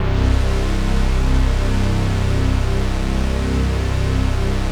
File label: CHRD PAD; Best Service; XX Large Pads; Sample CDs